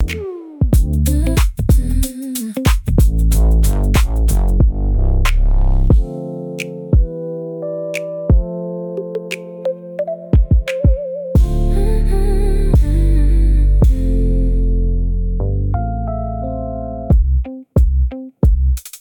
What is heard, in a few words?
loop